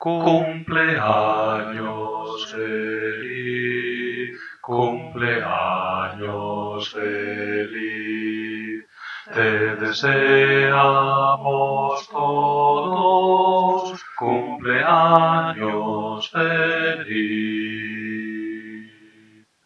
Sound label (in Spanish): Cumpleaños feliz cantado Bajo